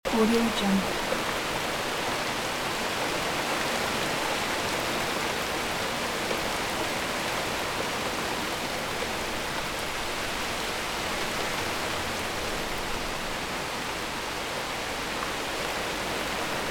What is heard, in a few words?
intensive rain hum loop; بارش شدید باران; nature sounds